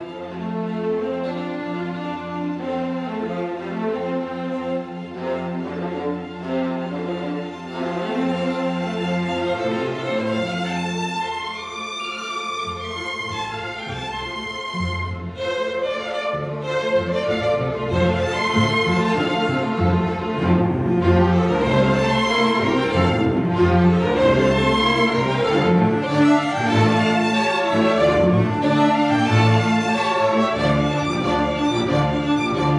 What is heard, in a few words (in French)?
quatuor américain 1er mouvement